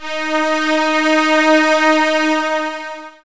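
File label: horn chord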